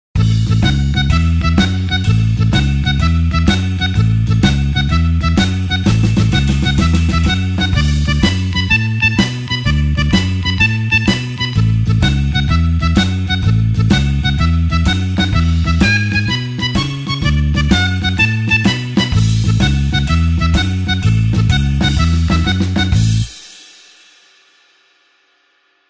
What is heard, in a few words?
blues harp shuffle A